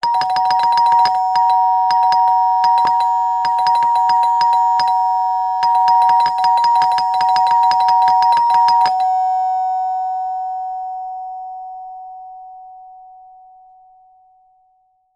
household door bell ding dong impatient multiple fast presses